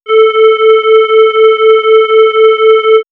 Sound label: Swirls Soft, pads; X-Static Goldmine